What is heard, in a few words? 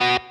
Staccato